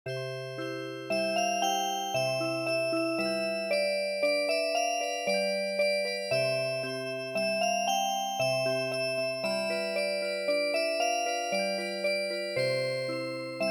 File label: Valse Alsacienne Music Box